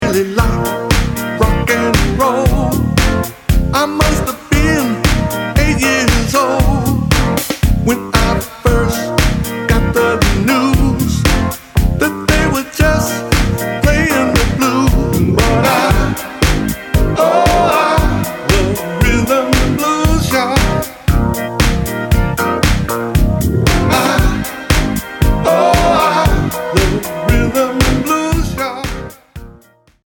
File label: Rhythm and Blues